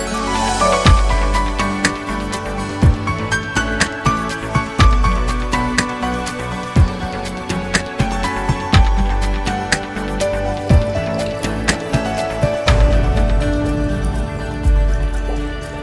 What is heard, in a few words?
Underwater music